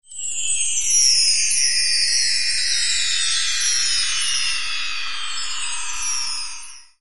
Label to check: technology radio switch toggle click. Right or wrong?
Wrong